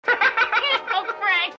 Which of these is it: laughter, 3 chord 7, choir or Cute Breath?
laughter